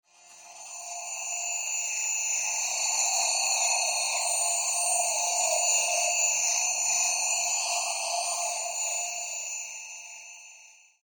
Science Fiction Teleport Rematerialize; Tech

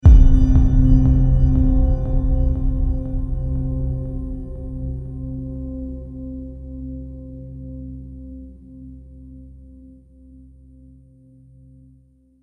Falling Echoes Trailer Hit; Trailer Sound Effects

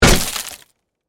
Impact, Door, Kick, In, Wood, Heavy; Breaking; Breaking Sound Effects; Breaking (Sound Effects:Objects)

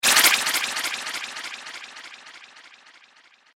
Robo Wizard Future Spell; Magic Sound Effects; science fiction sound effect; Andrew Fleming

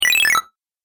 Game App Prize, Video Game Sound Effects

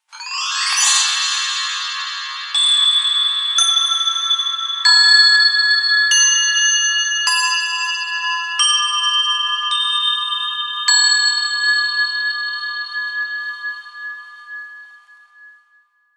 Bells Chinese Bell Tree Gliss; Bell Sound Effects; Bells (Sound Effects:Musical)

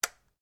RIFLE ANSCHUTZ 67MSR 22 CALIBRE SAFETY ON; A selection of domesttic firearms; Rifles; Guns

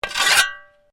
Shovel Scrape; Working with a shovel or spade; Objects

